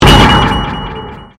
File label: Convolution Pulse